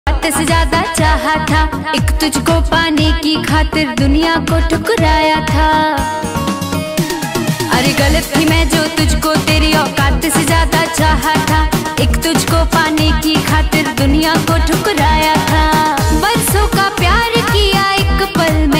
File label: bhojpuri ringtone